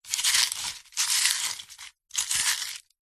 food herbs leaves grinding in stone pestle and mortar; zapsplat